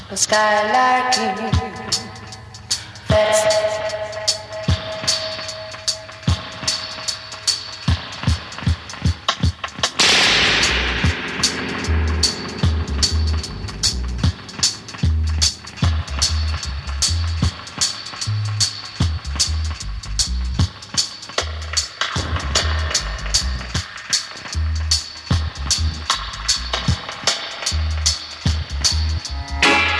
reggae

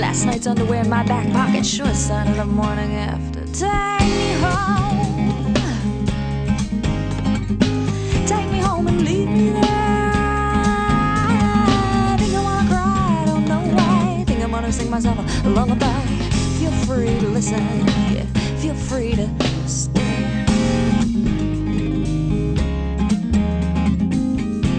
rock

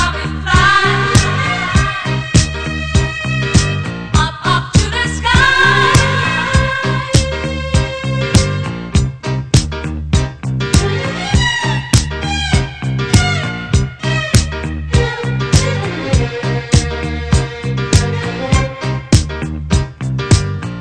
disco

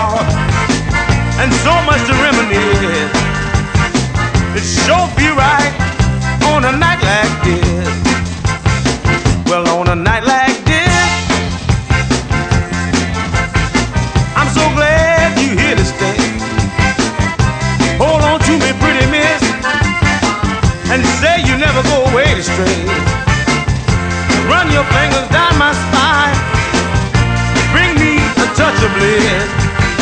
blues

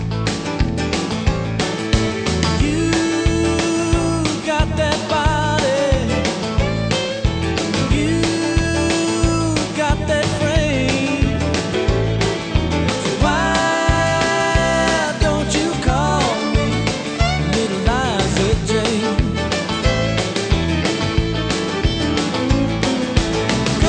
country

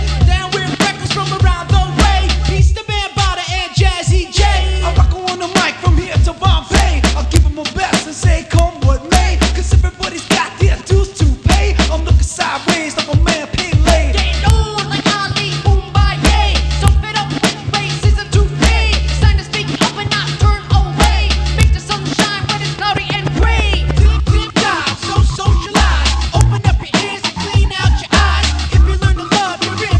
hiphop

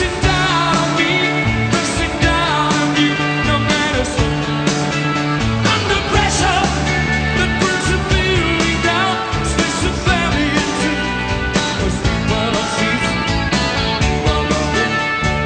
rock

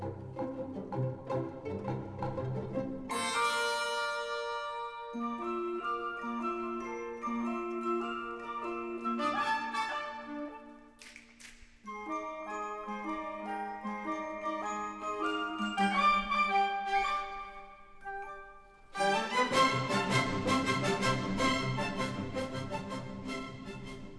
classical

